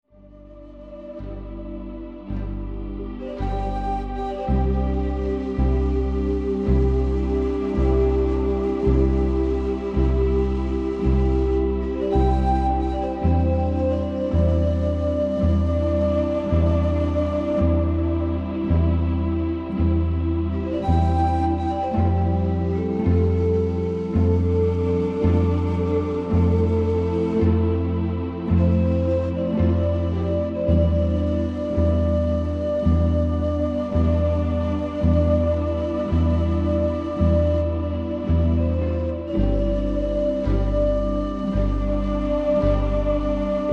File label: pure music